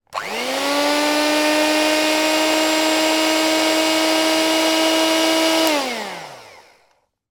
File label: household food mixer hand electric on run off short